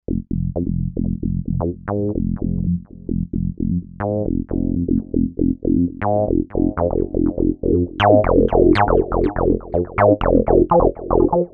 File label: Kawai K5000S